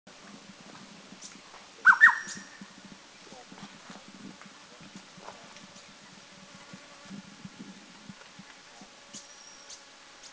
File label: Rusty-cheeked Scimitar Babbler Doi Lang, Thailand